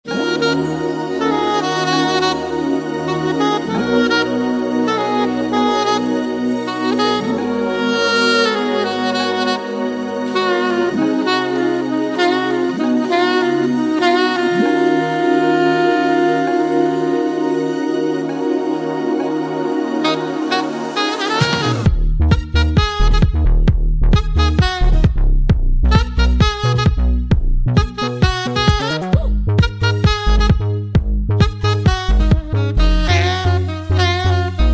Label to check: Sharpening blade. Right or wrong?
Wrong